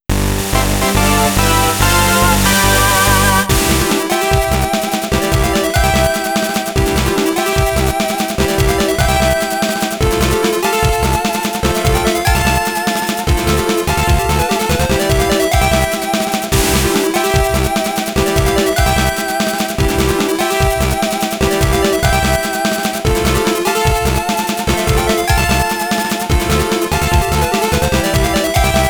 Great Victory!

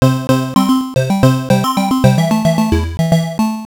Round Start Music; Dig Dug II; X68000

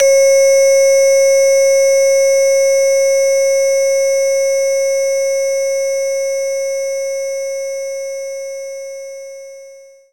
Vibes E2

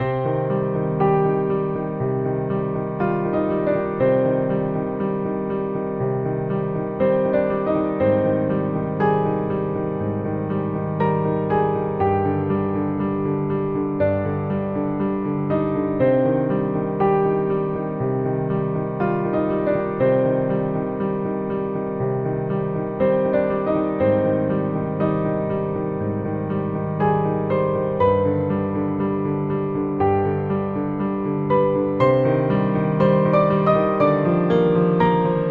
Main Theme (Piano); Rise to Ruins

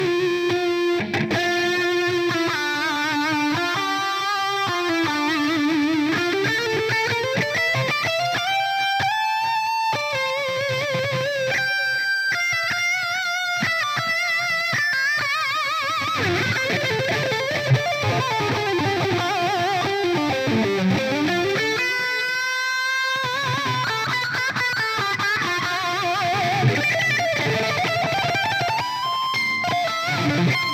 Music guitar; GnomeGnight

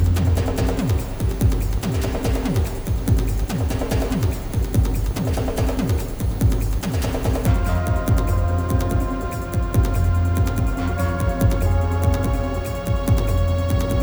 Ambient Skybar Room Lobby; Battlefield 3

